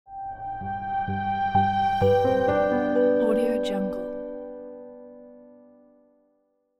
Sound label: elegant logo reveal